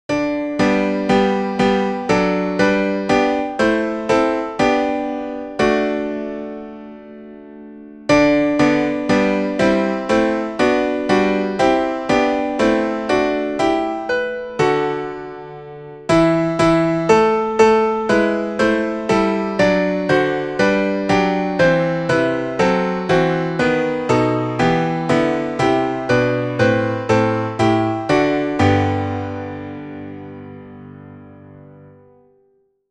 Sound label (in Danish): ALLE STEMMER